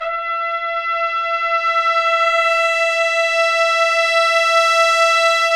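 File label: Brass Orch Ensemble; Roland L-CD702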